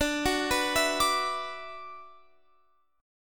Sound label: D 6th Add 9th D, Guitar, Open F; D6add9 arpeggiated